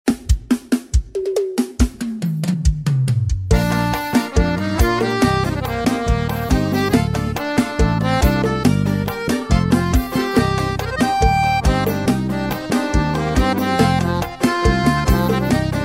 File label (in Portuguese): Playback Versao Forro, sax alto; forro cristao sax alto eb